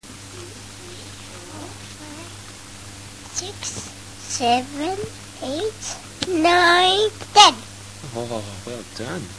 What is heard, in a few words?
squeek, baby; 26 mnths